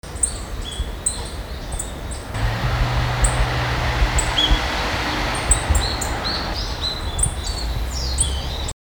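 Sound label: kuroji, grey bunting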